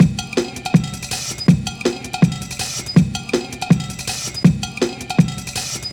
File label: 162, Jungle Breaks; Important; Jungle Warfare 1&2